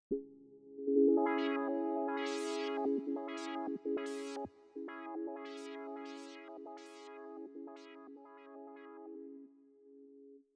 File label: yamaha moxf6 cutoff